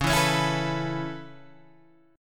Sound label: Db Minor 7th Sharp 5th Db down Guitar Open G